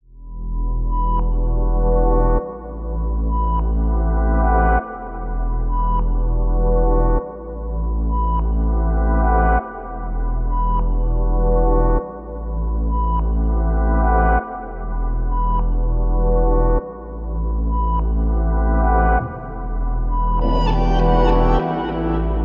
100 BPM E Min, Melodies Reverse; Cymatics; ZODIAC Beta Pack

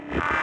Grid Fragment E 140 BPM, Psytrance; Xenon; Grid Loops

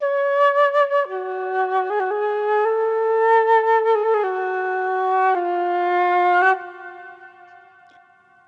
Flute Loop Wet - 113 BPM F# Min; Melodics; Instrument Loops; Flutes; Processed; Cymatics; Cobra Hip Hop Sample Pack

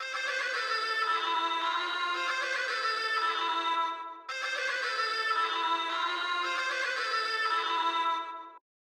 112 BPM A Min Duduk; Cymatics - 2022 Melody USB Expansion; Blue Pt 2

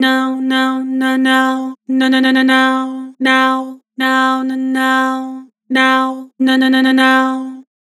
"Now", Harmor Vocals

